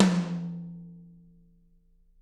TT, Toms; Bob Clearmountain Drums I

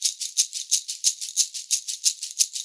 shak, percussion loops

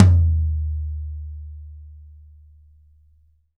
YAM 14X14, Drums; Big Fish Audio; Ross Garfield Drums VOL-2

